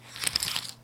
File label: chip move